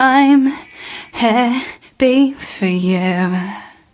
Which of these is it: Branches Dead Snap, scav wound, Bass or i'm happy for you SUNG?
i'm happy for you SUNG